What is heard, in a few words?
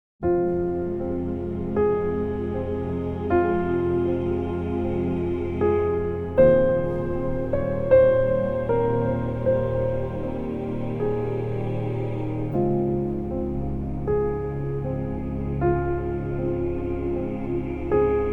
Piano Tribute; Ori and the Will of the Wisps Deluxe Edition